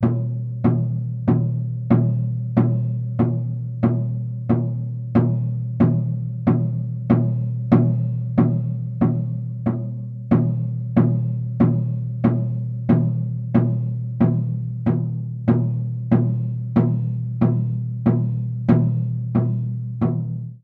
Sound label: Cheesebox drum; Stars (Tachenump)